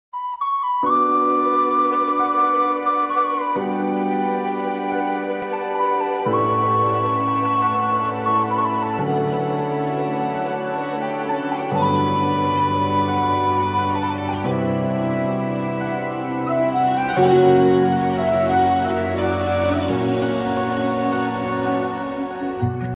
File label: song ringtone instrumental